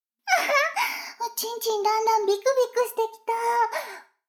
シコシコ音声, ASMR; 逆時間停止されて

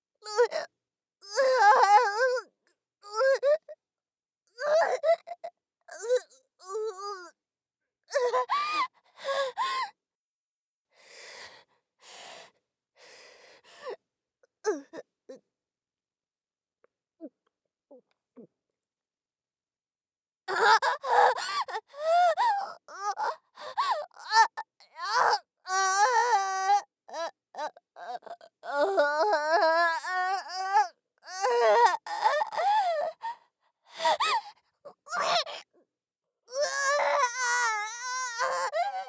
お薬の副作用; 効果音なし

